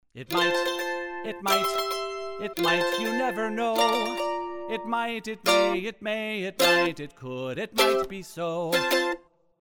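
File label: Mandolin Isolated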